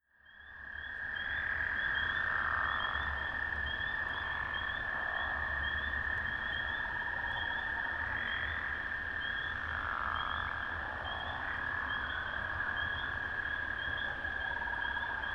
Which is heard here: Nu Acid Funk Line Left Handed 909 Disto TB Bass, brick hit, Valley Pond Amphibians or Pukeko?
Valley Pond Amphibians